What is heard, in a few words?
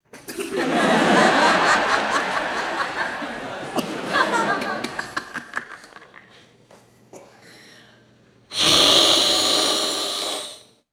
quiet theater audience with laughs and coughs; foley